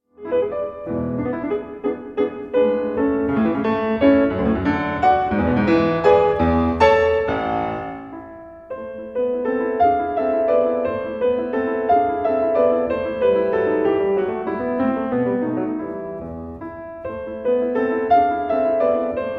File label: piano sonata no 2 in a major 3rd mvt scherzo; Ludwig van Beethoven; op 2 no 2